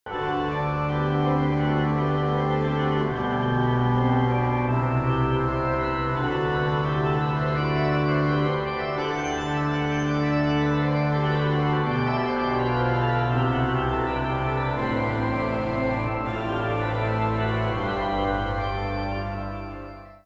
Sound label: Psalm 116 Orgelvers 4 a3 c.f. in de Bas